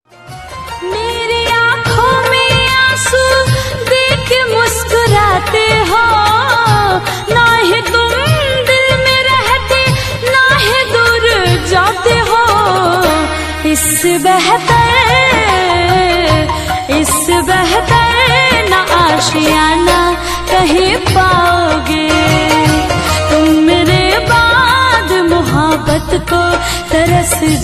Sad Ringtone

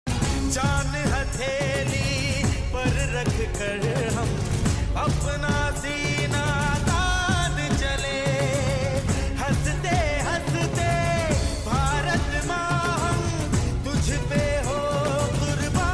Ringtone

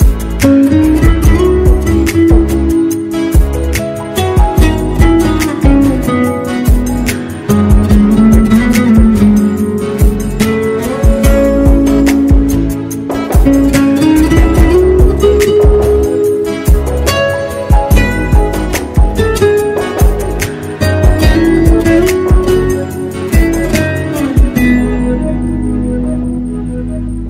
Bollywood Music Ringtone

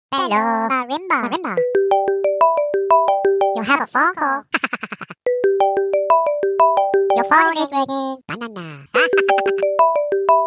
Minions Marimba Ringtone; ringtones

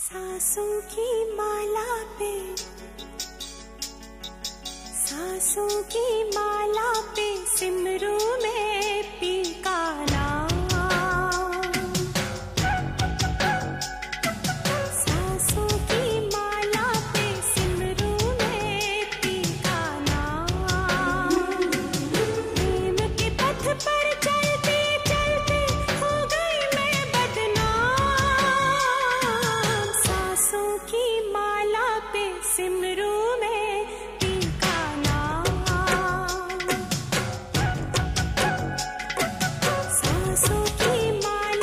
Old Hindi Song Ringtone

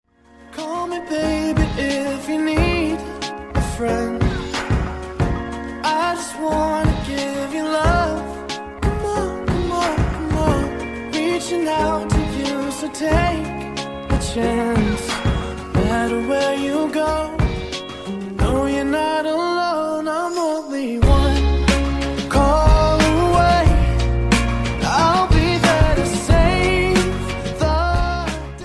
English Song Ringtone

